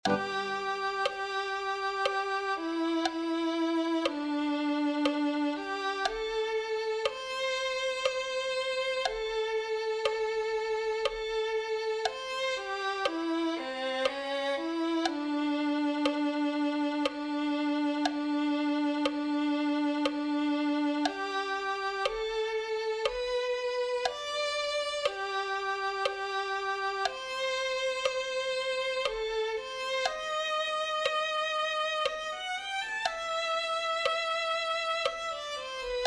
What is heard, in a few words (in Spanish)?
lluvia abundante violin